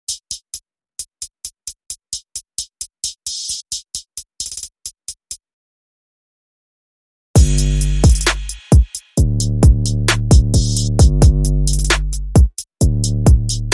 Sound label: Drums and Bass